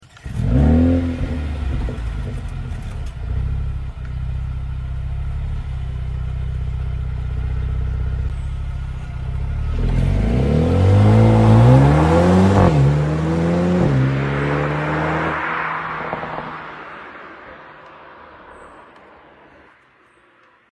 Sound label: Audio Start, cla45 amg